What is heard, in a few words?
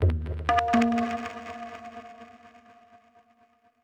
PERCUSSN ETHNO 125, Drum